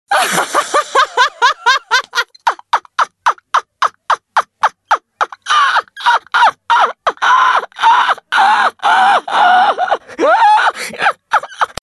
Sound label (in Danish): soeloeve ring; ringetoner til din telefon